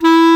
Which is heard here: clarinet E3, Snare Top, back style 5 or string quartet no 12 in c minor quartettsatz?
clarinet E3